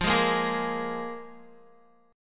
F Minor Double Flat 5th F Guitar; Listen to Fmbb5 strummed

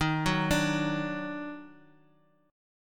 D sharp Minor Major 7th

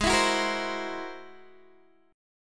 Ab Augmented 9th Ab Guitar DADGAD

